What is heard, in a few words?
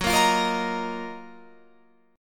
F sharp Major 13th Guitar Open F; F#M13 Chord; strummed